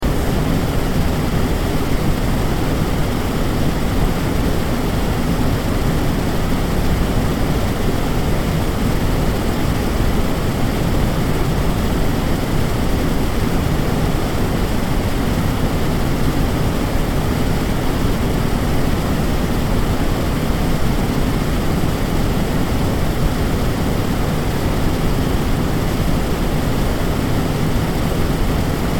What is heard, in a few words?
speech noise; ５分版